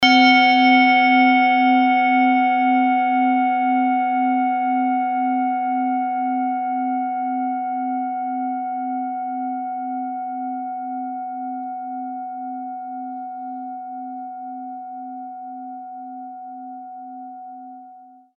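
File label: tibetan bowl sunset, F sharp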